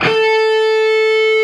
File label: long solo A#, heavy metal; Greytsounds